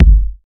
kick drum single hit g key, Royality free kick single shot tuned to the G note.